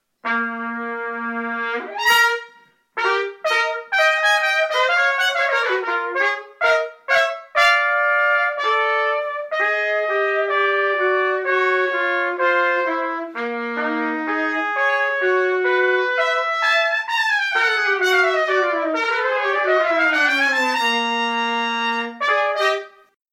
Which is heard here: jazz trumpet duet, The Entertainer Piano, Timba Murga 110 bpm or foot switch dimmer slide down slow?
jazz trumpet duet